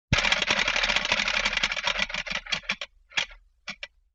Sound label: Window Shade Unwind; Vintage Cartoon; Foley